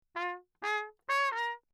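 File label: trumpet mute phrase; Perinétventiltrompete; Lechner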